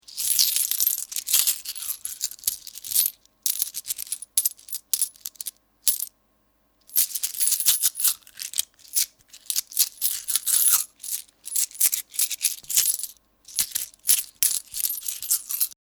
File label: Money In Hand, Sound of coins in hand.